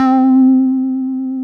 C Synth, One Shots

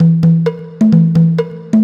Bongo 130, Perc Loops, Old Skool House

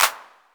808 CLAP; Univers Sons; Basicussions

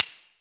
CLAPPER, PERC; AKAI S-Series CD-ROM Sound Library VOL-2

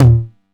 909 TOMS; Wizoo - Platinum 24 Electronic; 909 TOMS P2

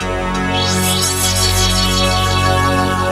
F#, Drum & Bass Pads; Total Drum&Bass; 90 s Sample CDs

